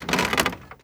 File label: foot wood creak, footsteps